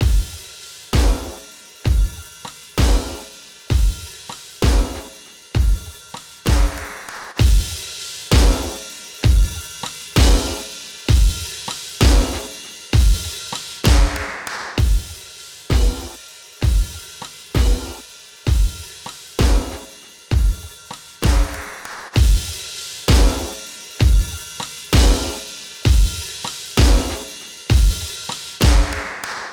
drum loop

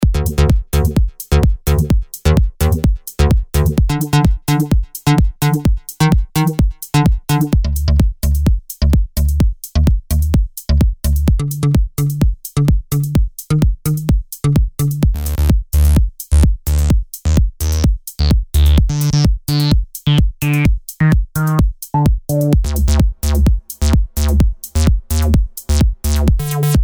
basses

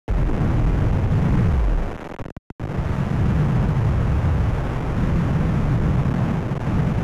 apocalyptic filtered seismic

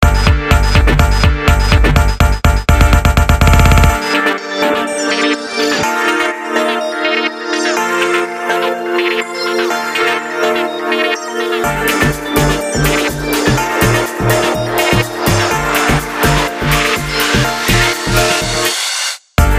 124 house; drumtroop

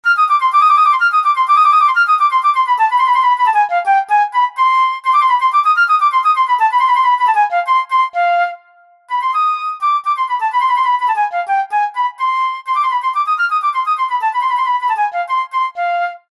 flute staccato

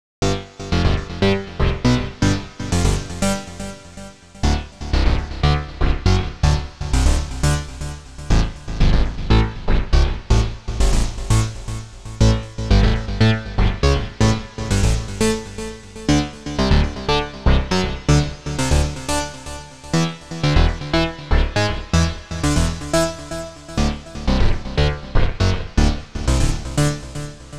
seq 16 a noise meets the

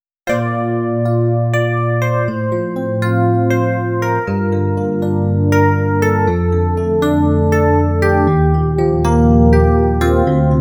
rhodes

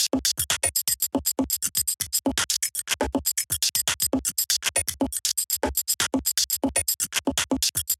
machine tribes loop

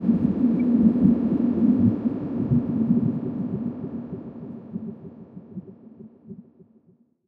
submarine stealth

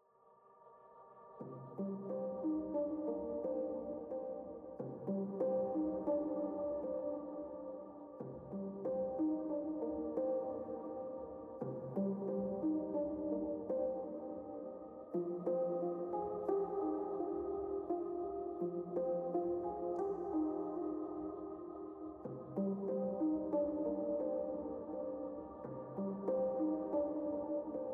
softly and warm

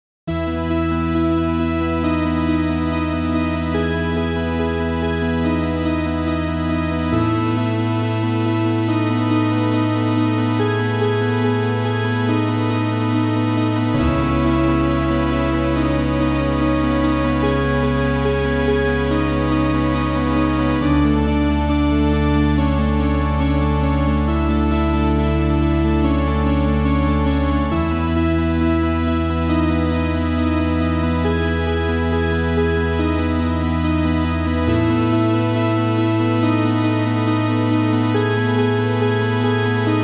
church brass

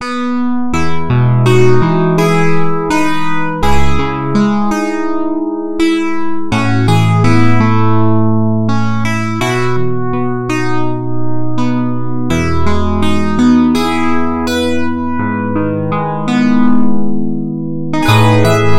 keys electric piano

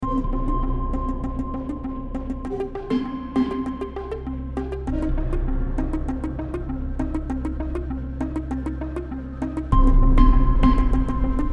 quetzal beat

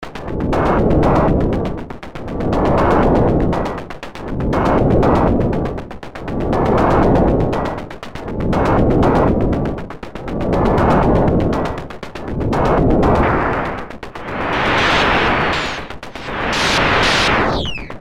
master lfo rgb noise pulse sound

